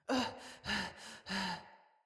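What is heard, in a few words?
Cry Heavy Run Female; Battle Barks; Envoy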